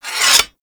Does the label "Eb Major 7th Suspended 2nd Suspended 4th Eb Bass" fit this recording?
No